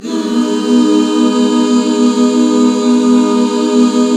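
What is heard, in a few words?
G# minor, back chords; Sound & Vision - Gigapack II CD 1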